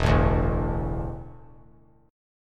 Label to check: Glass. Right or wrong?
Wrong